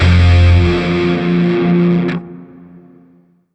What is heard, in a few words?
Shawzin Min Chord; Warframe; Grineer Shawzin